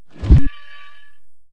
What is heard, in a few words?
Death bubble in